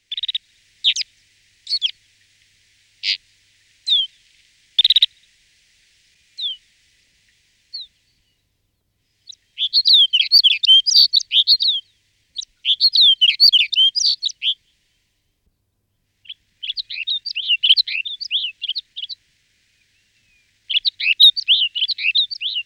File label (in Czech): Snehule severni Plectrophenax nivalis